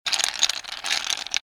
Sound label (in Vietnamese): Tieng lac da trong ly